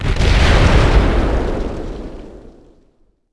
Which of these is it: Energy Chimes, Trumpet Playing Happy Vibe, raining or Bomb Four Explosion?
Bomb Four Explosion